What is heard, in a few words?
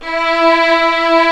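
strings, 8pc, vibrato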